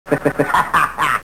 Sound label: HA HA